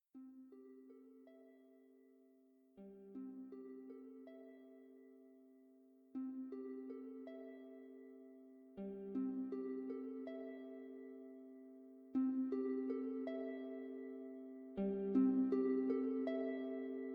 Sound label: ambient; PhoenixCraft 1.16.5